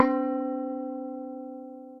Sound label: Bell Guitar (Multisampled), Placid Plucks; Ableton; Mood Reel